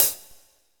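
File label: hi-hat, drums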